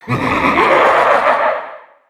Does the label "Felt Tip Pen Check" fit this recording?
No